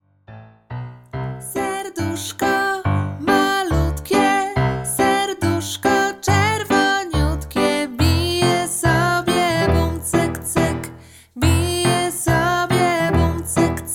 wersja wokalna

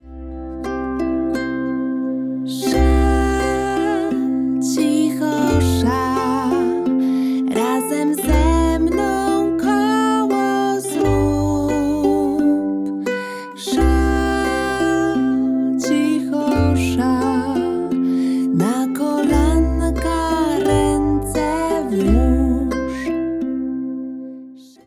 wersja wokalna

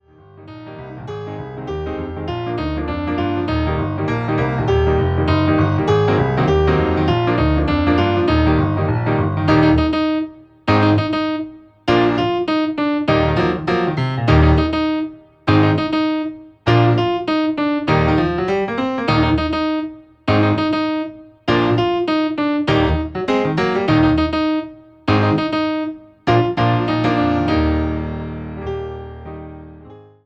instrumental z linia

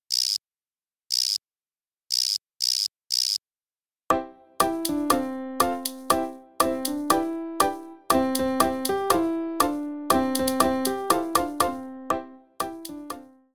Zabka instrumental z linia melodyczna

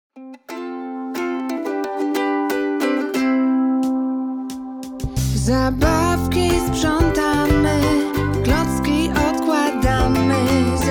wersja wokalna

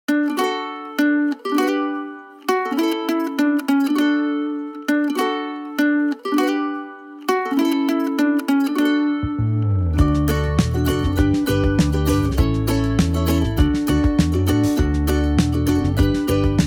wersja instrumentalna

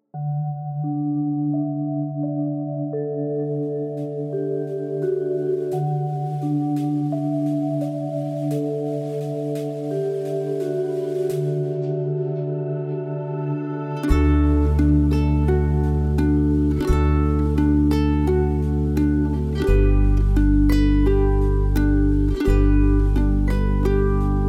instrumental

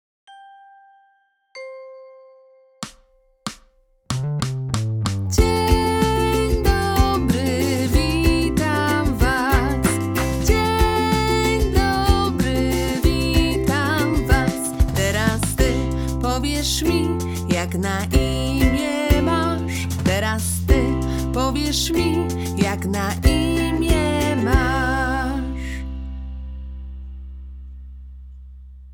wersja wokalna

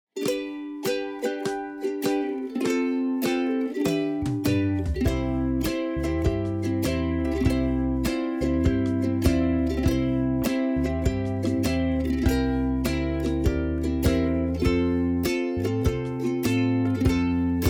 wersja instrumentalna z instrumetami

